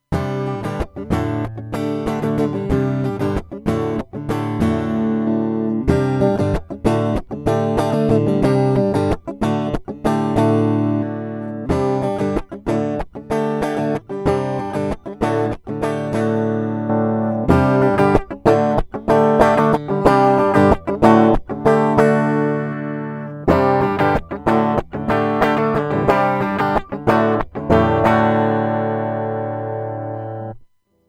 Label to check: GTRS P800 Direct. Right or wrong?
Right